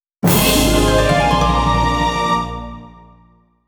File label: Fanfare Got a Heart Container